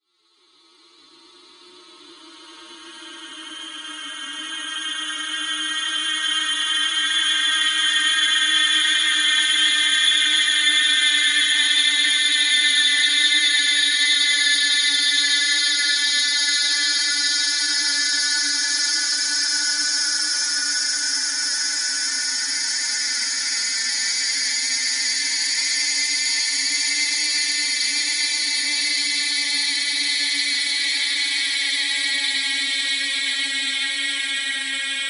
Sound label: Sample Lower Shimmer; SoundChaser; CerebralAudio